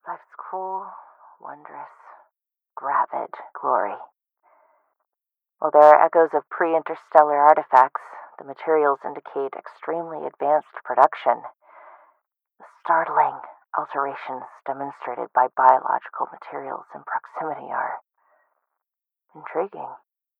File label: Womb; Mothership; UCR Audio Horrors